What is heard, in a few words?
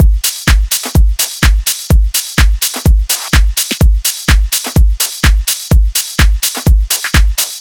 126BPM Drums, TechHouse; Offshore; Vengeance Samples; Bonus TechHouse Kits